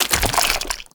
Step Sludge, Footsteps, Walk; Warframe; Characters; Tenno

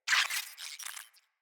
Space Drone Attack; Corpus

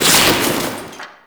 Debris Fabric; Warframe; Entrati; Ambience

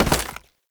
Step Ice, Foley; Warframe; Oraxia

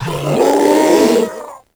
Proto Infested Ancient Strike Scream; Warframe; Abilities

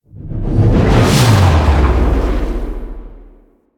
Death Fade; Arthur; Warframe; NineteenNinetyNine; Stage12PartyOfALifetime

